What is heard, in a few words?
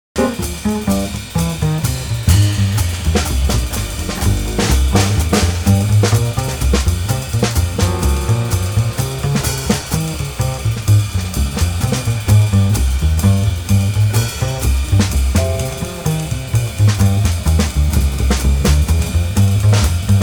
Jazz Piano Trio Upbeat Chill